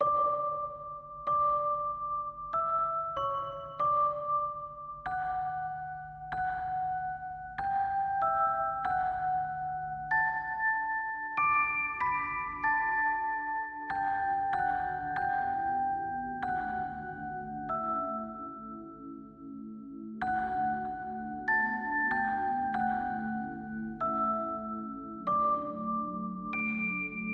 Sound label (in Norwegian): Deilig Er Jorden (Norwegian Christmas Song Based On Schesien Melody), Verse 3